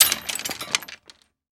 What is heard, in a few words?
Impact Chain Small Metal Chain Drop On Metal; Impacts